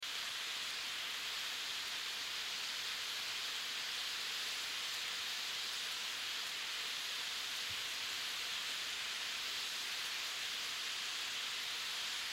Grill Sizzle Cook, Grill Sizzle Cook Food Loop Restaurant; Food Prep; Industry; Blastwave